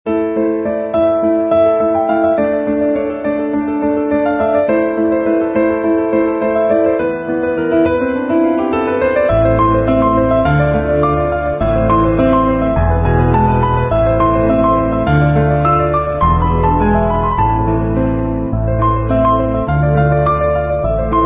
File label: Theme Piano Ringtone; Kadhalar Dhinam